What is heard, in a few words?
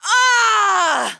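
female death; WarRock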